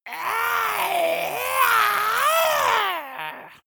monster scream high

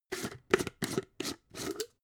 jar glass plastic open

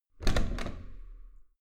metal door heavy handle use hard

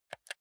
console controller r1 press

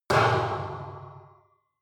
metal impact can large ring hard

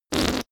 whoopee cushion fart

